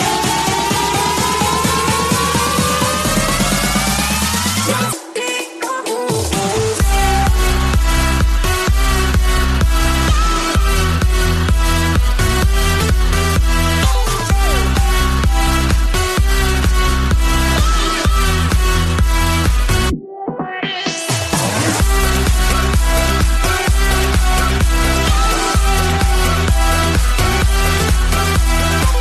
electro

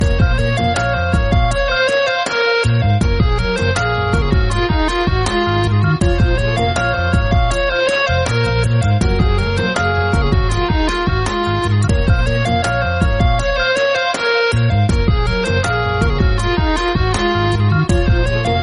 instrumental ringtone; bollywood

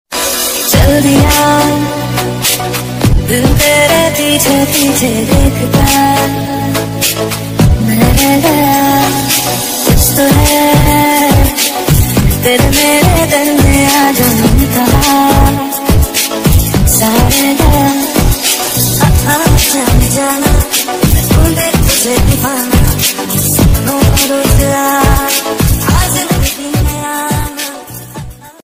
bollywood love music ringtone

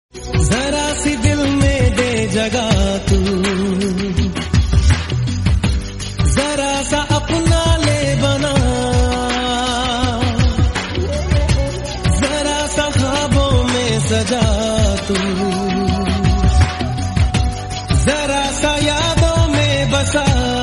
romantic Hindi tone ringtone; bollywood ringtones

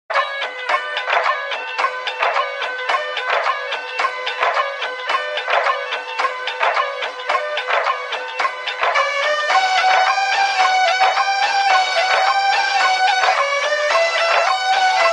bollywood remix music ringtone

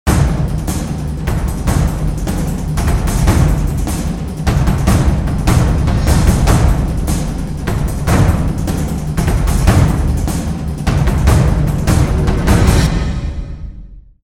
dramatic drums, ringtone

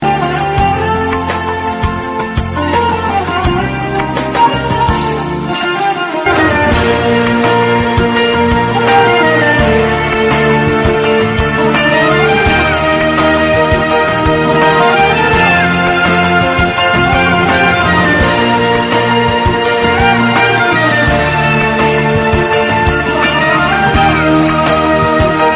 guitar favourite ringtone

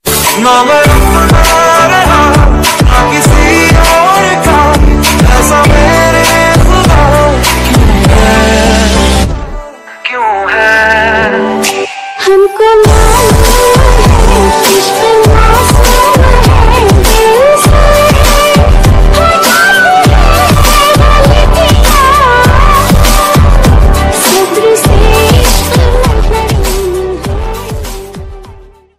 bollywood ringtone

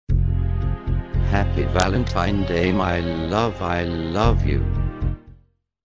happy valentine ringtone

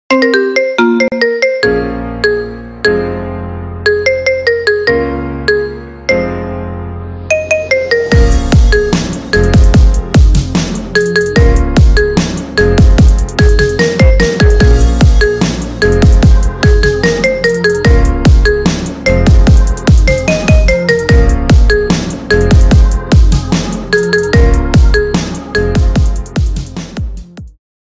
marimba ringtone

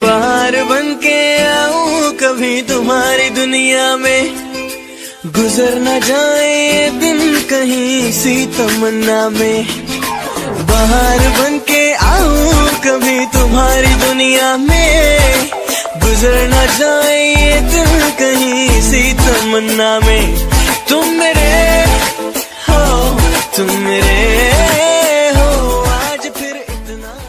beautiful ringtone

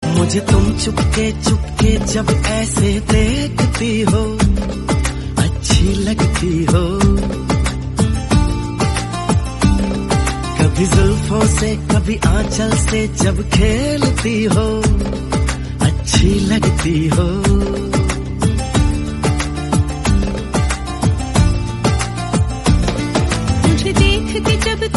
hindi song tone ringtone, bollywood

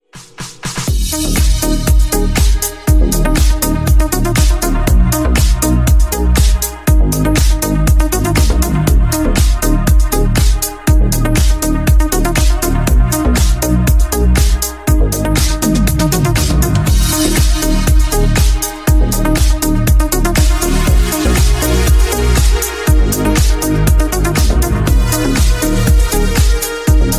arena music ringtone

